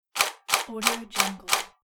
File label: camera shutter burst mode